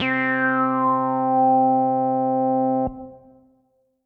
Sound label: Synth C, Synth Prophet One Shots; Electronisounds